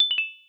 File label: ding down